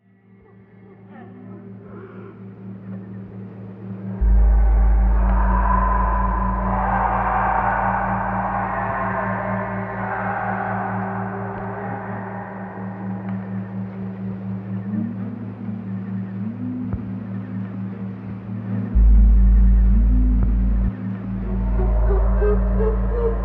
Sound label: Scary Sounds - Ominous Signs